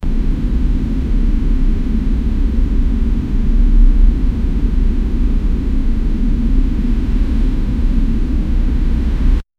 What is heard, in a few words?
sound effect of interior, computer